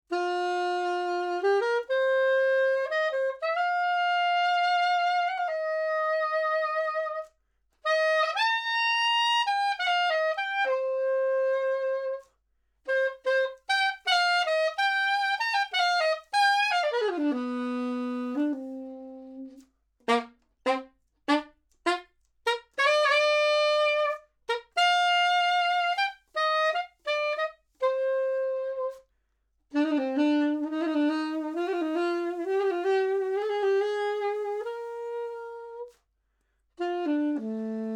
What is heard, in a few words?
Sax Soprano 50cm C High